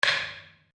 Wood On Wood

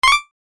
CHIME SYNTH; Blastwave

